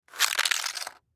Cell Slide, Cell Telephone Slide Cement Sudden Stop; Technology; Blastwave